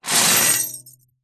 keys large bunch prison guard pick up from metal surface hollow